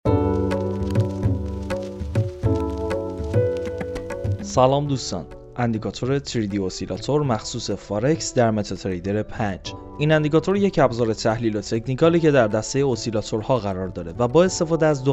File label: Oscillator